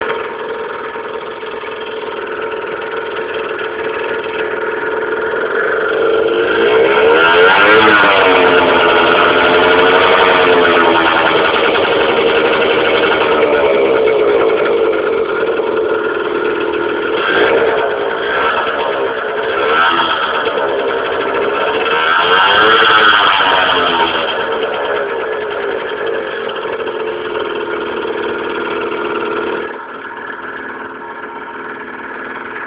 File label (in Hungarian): motorhang